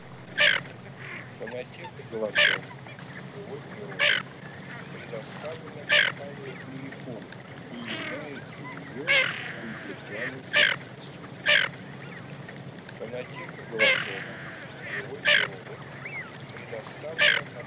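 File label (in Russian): Хохлатая чернеть Moretta, манок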